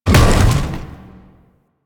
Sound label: Bowl Ball Impact; DE; Warframe; Grendel